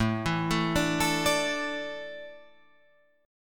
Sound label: A Suspended 4th, Guitar Drop D